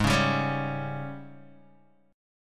Ab Major 7th Suspended 4th Ab down Guitar 4E 4A 5D 5G 5C 6F